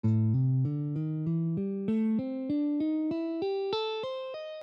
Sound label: A Blues Hexatonic, guitar